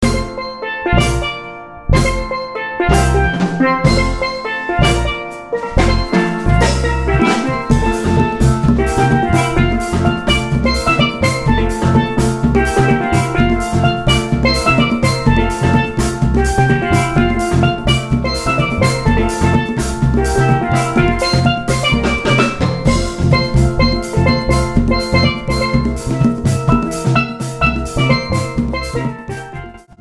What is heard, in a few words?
Steel Drums